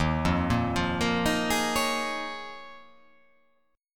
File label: D sharp 7th Suspended 2nd Suspended 4th